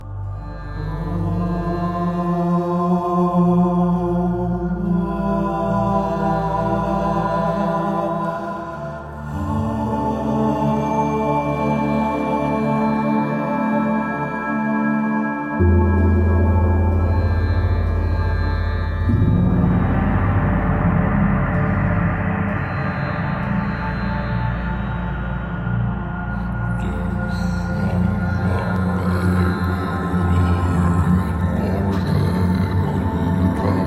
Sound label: MANTRA